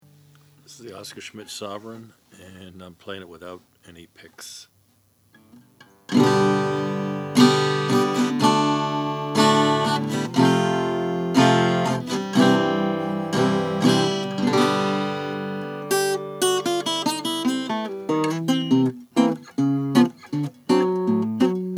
oscar schmidt sovereign natural

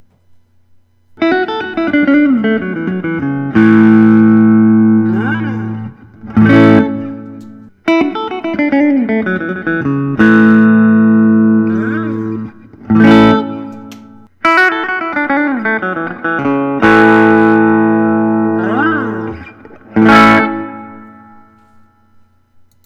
collings i 35 lc with lollar faded cherry

